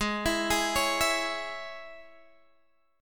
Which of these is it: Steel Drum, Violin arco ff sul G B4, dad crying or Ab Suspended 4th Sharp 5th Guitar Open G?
Ab Suspended 4th Sharp 5th Guitar Open G